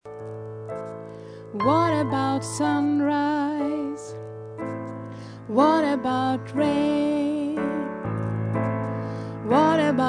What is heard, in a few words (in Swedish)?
alt, stammor